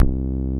SPIKE BASS; Masterbits; Dance Trax

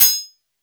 PERC, Drum & Bass, 160 BPM

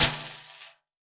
ocean, World Percussion; World Instruments 2; PS MIXED PERCUSSION

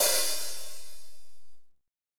KOMMERZDRUMS

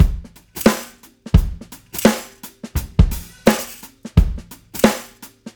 CHUNK, CLEAN KIT; Tuna Beats

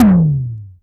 tom, Korg Prophecy

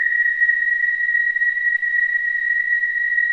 WHISTLING; Spectrasonics; Symphony of Voices

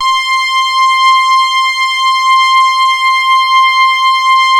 TARK SAW, Synth; InVision Interactive; Keith Emerson; The Most Dangerous Synth and Organ; SYNTH LAYER

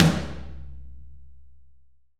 H AMB L TOM, HALL AMB TOM

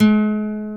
GTR, Nylon Chorus; Roland LCDP02 Guitar and Bass; Nylon String

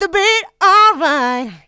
Female Vocals; Houseworx

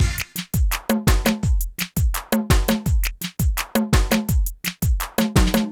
PHATT BEA

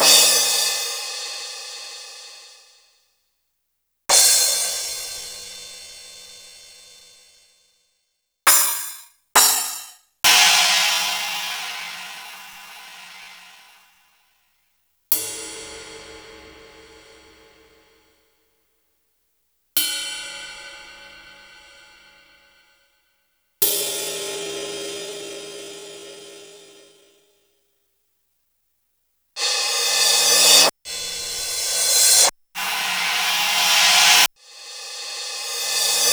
Roland R-70 Cym

